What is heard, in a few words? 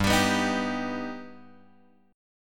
G Augmented; G+ Chord {3 X 5 4 4 3} strummed